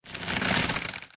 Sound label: bow nock; TFC Quick Pockets